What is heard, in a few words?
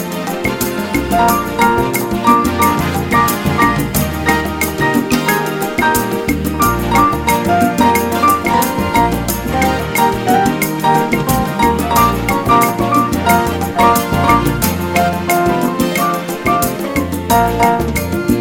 Deep and Hot Salsa